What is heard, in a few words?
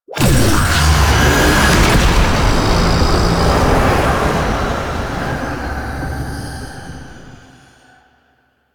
Struggle Prompt End; Warframe; Lotus Eaters; Quests